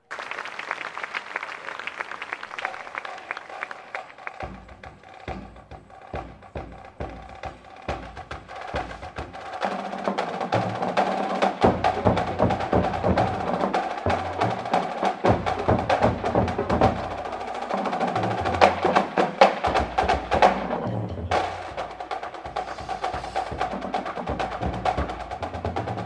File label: Cadets Cadence; Phantom Regiment